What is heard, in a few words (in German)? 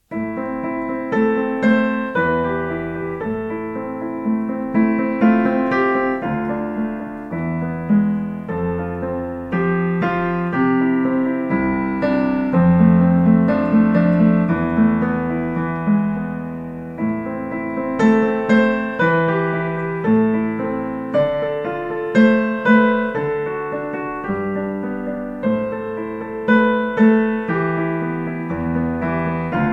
instrumental